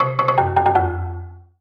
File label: Solo Xylophone Negative; MUSIC EFFECT; startide